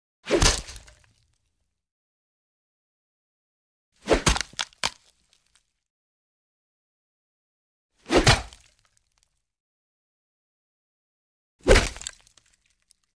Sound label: Bone Breaks With Whooshes Whoosh & Impact With Crunc; Hollywood Edge Foley Sound Library